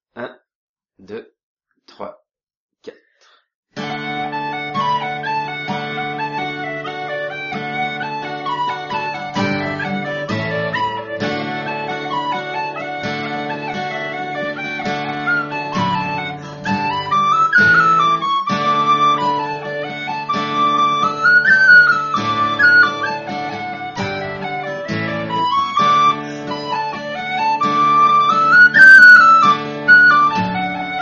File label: Guitar Accom; The Mountain Road